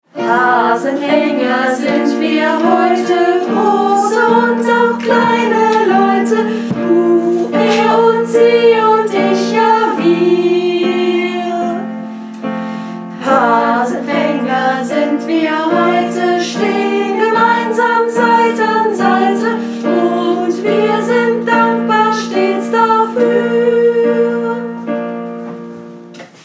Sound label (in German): Hasenfaengerhymne mit Gesang